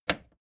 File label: record player play push